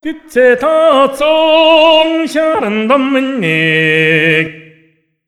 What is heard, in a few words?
MONGOL, Asian Traditions; USB Soundscan vol.60; AKAI